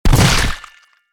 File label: Splattering Hit; DJ sounds